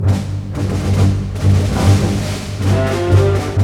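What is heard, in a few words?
70, orchestra; East West; Scoring Tools; Bolero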